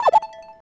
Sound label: call disconnect; Samsung SGH-A737; EffectTone